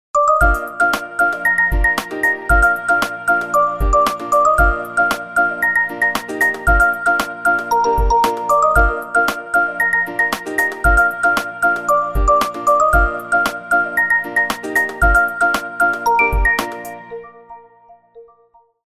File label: ring, ringtones; Umidigi; A15